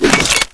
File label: hyperblaster